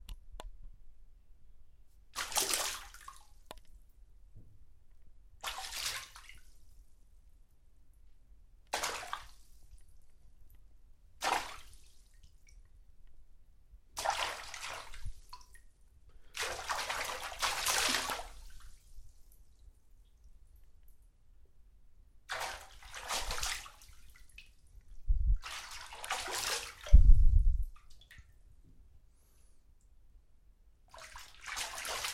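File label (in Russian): вода плюх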